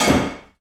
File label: Clank